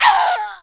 injur

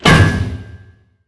Big M Ejct

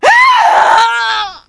death

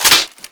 close; spreadgun

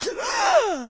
gasp

